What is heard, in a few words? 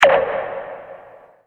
pop, urban instruments